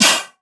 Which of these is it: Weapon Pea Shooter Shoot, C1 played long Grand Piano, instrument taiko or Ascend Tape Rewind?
Weapon Pea Shooter Shoot